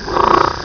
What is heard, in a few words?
rio grande leopard frog call; texas nature trackers; amphibian watch